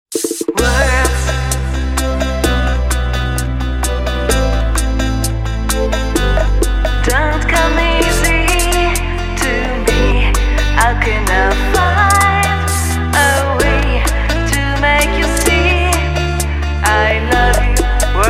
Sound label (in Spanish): Bachata